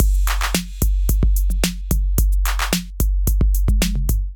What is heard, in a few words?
808 Kit groove 110 bpm, Drum Essentials; MIDI Clips; Aristocrat; Ableton